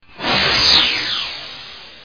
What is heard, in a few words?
start fly; Brazil; crash